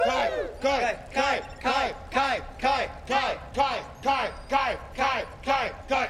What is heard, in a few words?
Chant